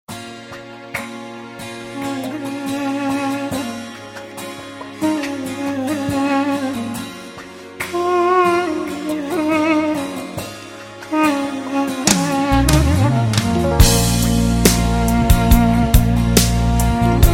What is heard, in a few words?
Duduk; Duduk Bit Armenia